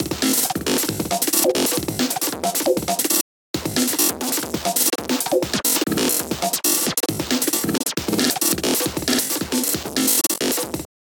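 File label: drum beat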